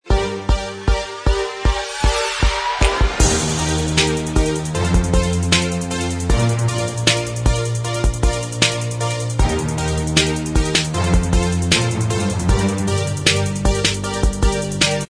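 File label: instrumental, backing track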